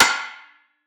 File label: perc metal; Drum One Shots; SD MIDNIGHT Sample Pack; Slate